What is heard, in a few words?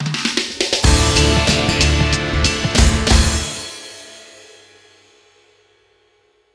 jingles; Virtua Quest